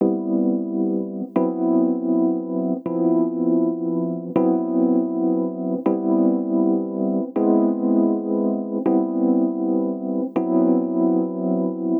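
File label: Pop Mark II, Amaj, 080 bpm, E-Piano; Pop Rock; E-PianoClav; Pro Tools Essential Loops; Digidesign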